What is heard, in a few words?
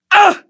Elder Exert, voiceovers; english